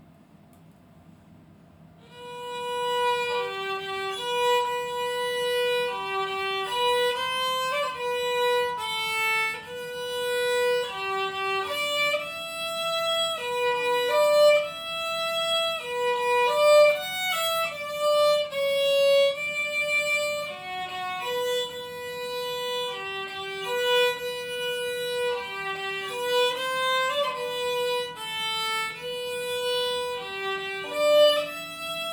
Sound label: Brahms waltz